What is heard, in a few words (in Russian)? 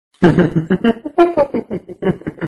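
идиотский смех granny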